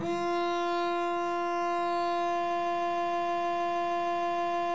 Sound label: DBL BASS, Synclavier Strings; ILIO